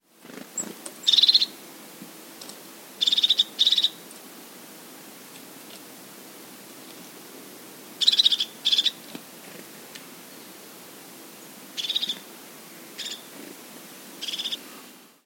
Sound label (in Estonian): tutt tihane; kloostrimets